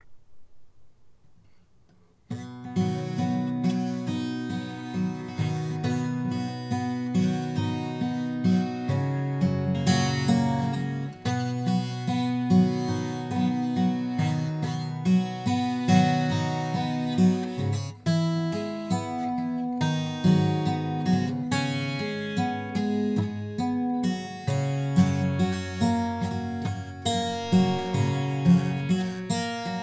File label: pick 12 left, hsguitar